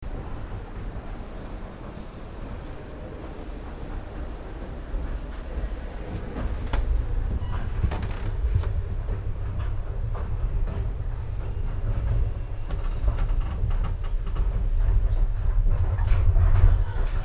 binaural recording of the paternoster in Charles University, Prague, Czech